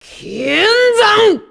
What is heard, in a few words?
destructo disc charge